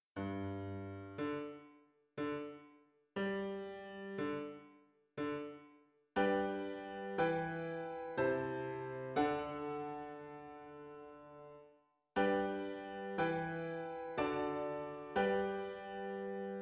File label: Piano